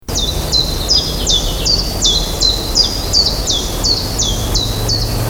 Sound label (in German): Zilpzalp